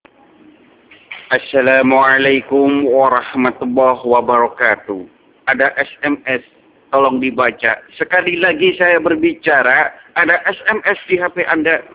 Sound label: Sms